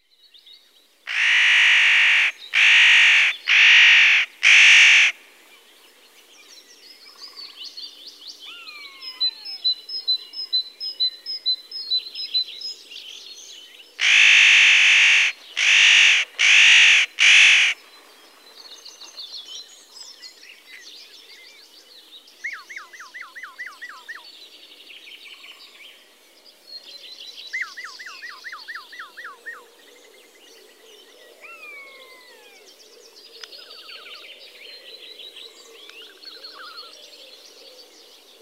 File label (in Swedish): nötkråka